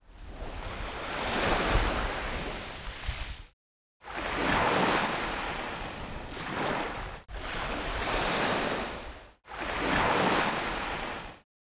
crashing waves; Adventures in Lestoria; action RPG game; Sig Productions

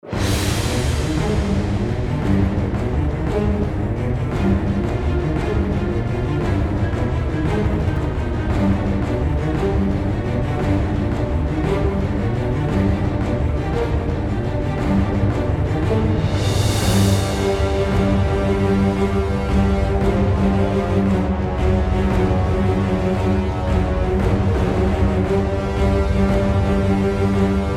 bgm battle guardian boss; dragon nest

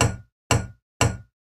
item enhance; action RPG game; Adventures in Lestoria; Sig Productions

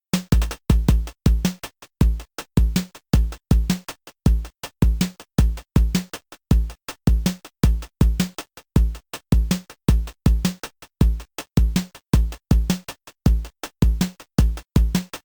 drums; Adventures in Lestoria

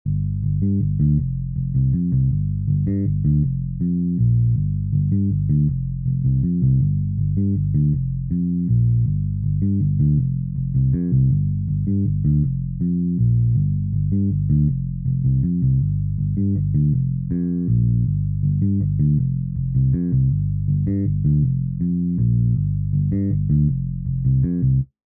bass, music

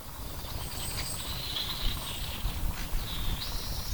birds; Adventures in Lestoria; action RPG game; Sig Productions

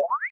chargeup; action RPG game; Adventures in Lestoria; Sig Productions

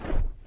wing flap

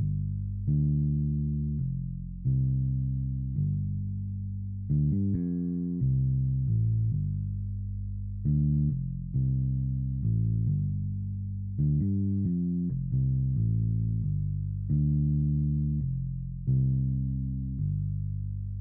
bass; Adventures in Lestoria; action RPG game; Sig Productions

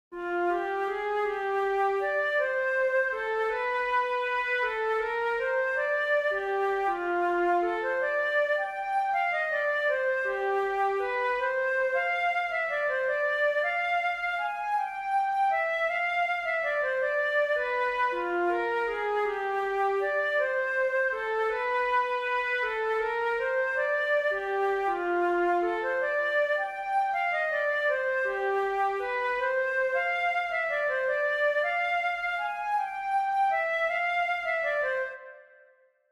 flute, music; action RPG game

